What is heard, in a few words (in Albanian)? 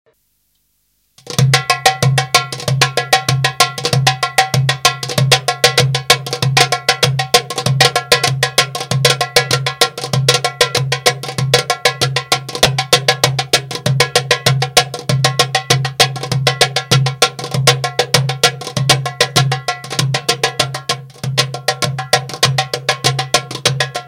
Valle Dasmash, muzik shqip